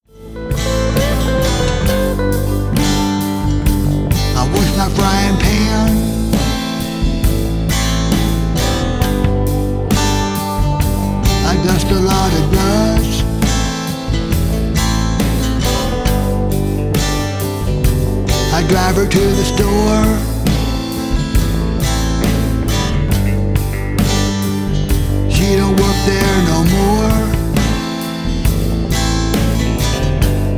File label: scream